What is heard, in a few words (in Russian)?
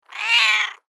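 уверенное мяу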